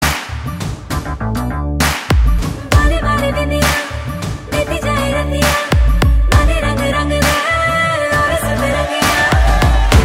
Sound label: Instrumental Ringtone; Bhuj Pride Of India